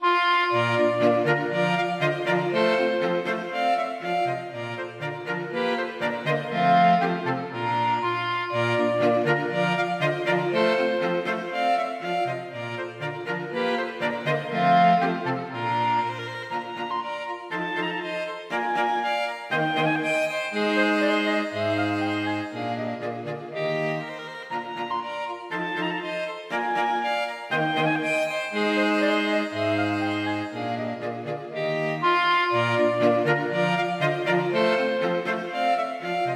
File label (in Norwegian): Fejer for strykekvartett og klarinett